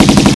autotower attack; guns n zombies 2014 pc

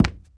run wood

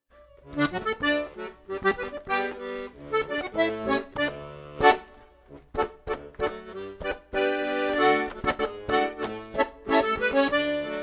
Accordion; Assassin's Creed Syndicate

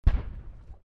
Flak Shot

